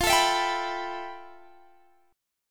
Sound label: F Major Sharp 11th